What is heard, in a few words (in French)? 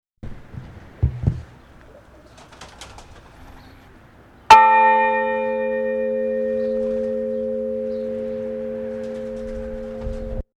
cloche; Lérigneux